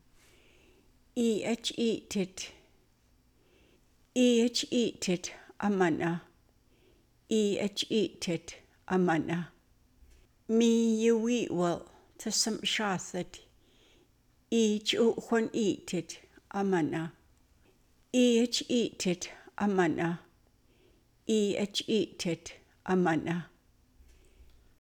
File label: are you sleeping